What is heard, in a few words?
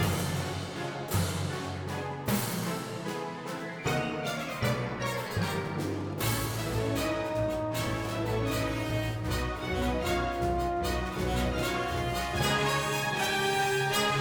Fight Music; Winx Club 4Kids Background Music